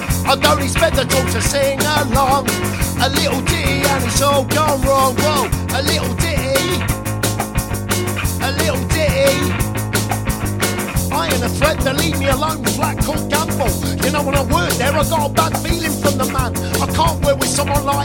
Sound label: mixed